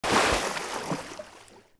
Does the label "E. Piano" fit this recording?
No